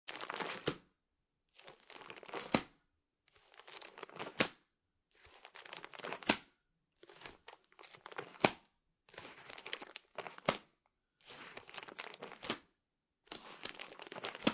CD Wallet Flipping Through Pages, Foley, CD Wallet, Flipping Through Pages Of CD Wallet